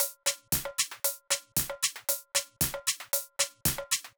Drumloop 115bpm, Nu Disco; Zero-G

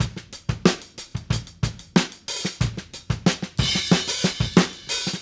Call And Response Drums Fill, 70s Analog Funk; Sonic Foundry (Sony Creative Software); What it Is!

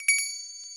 S. BELL, METAL & WOOD; Roland - LA Composer vol. 1

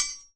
Kitchen Foley Dry Drums; Antidote Advent; Kitchen Foley Sounds & Loops

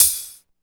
AMB HAT; East West - Phat & Phunky CD2; 90's Sample CDs

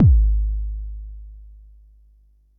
Bassdrum, Jomox Xbase09

